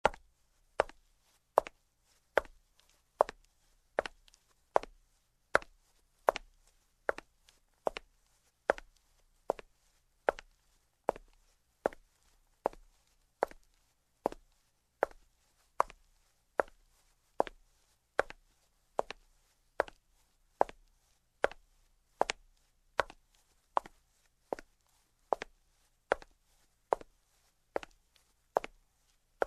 Steps on the marble floor in shoes

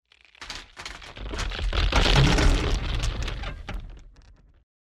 Splitting wood floor

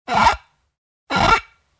finger on a clean plate; tableware

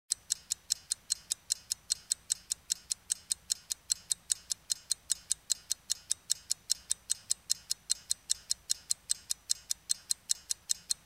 Ticking of a wrist mechanical watch; watches

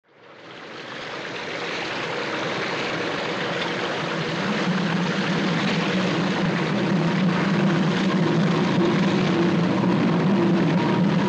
Submarine engine start, movement on the surface

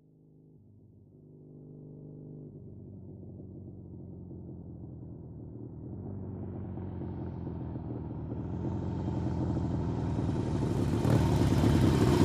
Motorcycle approaching, turning off

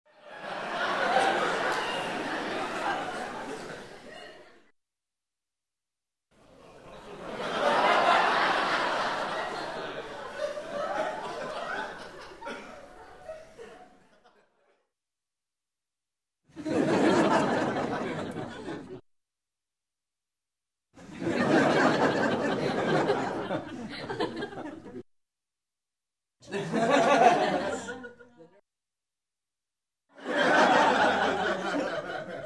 Laughter of the crowd in the room

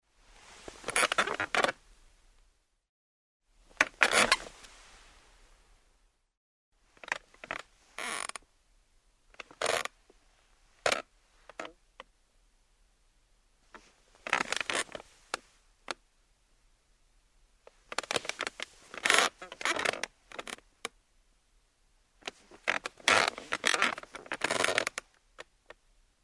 Wooden chair, sit down, stand up, move

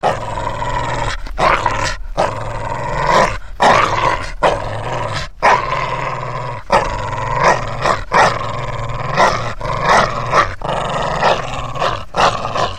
dog growls

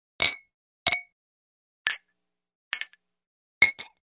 Two glasses clink; tableware

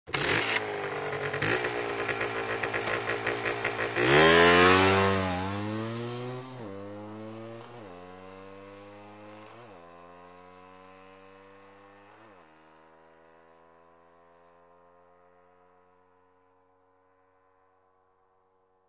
Motorcycle starts, waits, drives quickly

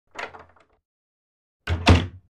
Wooden door opens, closes

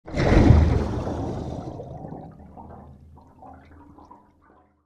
Pressure relief when opening a submarine hatch

